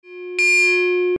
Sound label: VCO Mode C LFO Sweep